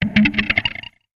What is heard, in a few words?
Music Muted E Guitar